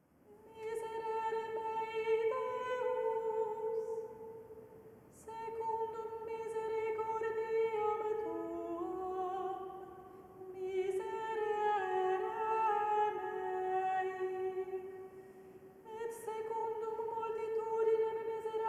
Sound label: gospel acclamation lent